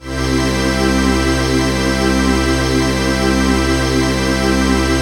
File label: PHASE PAD, ATMO PADS; Best Service; XX Large